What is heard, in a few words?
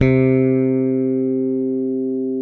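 Spector bass C2 hard; Northstar - New Gold VOL-1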